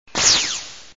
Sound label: key Sold